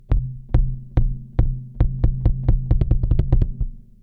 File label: Bass drum; Smasher